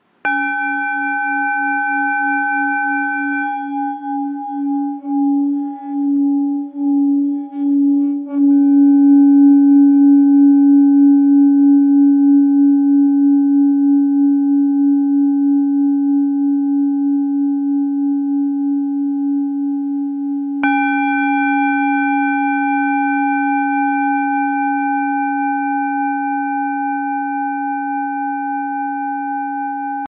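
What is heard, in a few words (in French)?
Bol bengale do dièse; 6-700g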